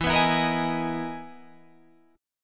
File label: E Major Sharp 11th E, Guitar, Drop D